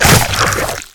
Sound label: zombie slice